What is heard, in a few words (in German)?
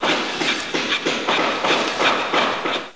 werft; Anno1602